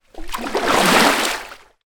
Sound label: transport boat paddle water single